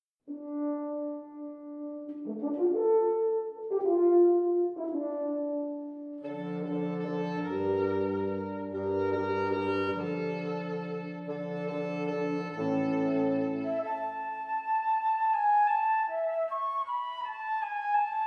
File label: Quintet